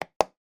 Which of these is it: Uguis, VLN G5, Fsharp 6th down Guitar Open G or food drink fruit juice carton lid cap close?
food drink fruit juice carton lid cap close